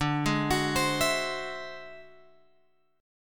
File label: D 9th Flat 5th D, guitar; D9b5 Chord {10 11 X 11 13 12} arpeggiated